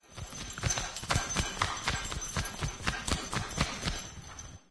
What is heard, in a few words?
dog scratch behind wall, animal; ambient; Source Engine; cstrike